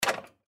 Weight Scale Small Place Object On Top Weigh; Miscellaneous Household; Blastwave

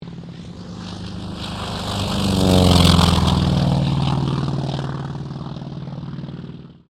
AIRCRAFT T-28D TROJAN TAKEOFF; Airplanes (Sound Effects:Vehicles)

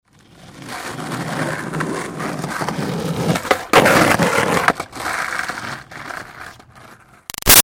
Skateboard Olly Rolling Moves; Pass Bys; Sports; Skateboarding; Big Room Sound

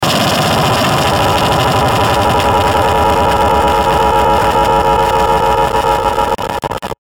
Distortion Digital Scrape Stutter; Blastwave

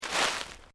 Footsteps Sneaker Leaves Deep Step; Footstep Foley; Sound Effects:Human

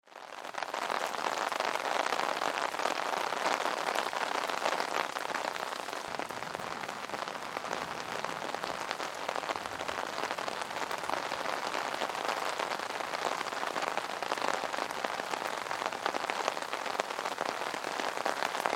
Weather Umbrella Rain Underneath Perspective; Wind

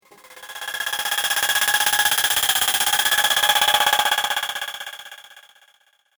A Fast Vibrating Drone; Drone Sound Effects

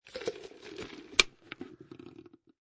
Drink Close, Soft drink cup plastic lid close; Miscellaneous Human; Soft drink cup plastic lid close, variation 3

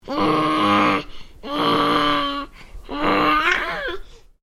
Baby: making vocal noises, slight growl; Babies; Voice

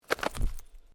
Clothing Jacket Sleeve Flap; Movemement sounds for various articles of clothing; Dressing; Human

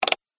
Pc Mouse Wheel; High Tech Sound Effects

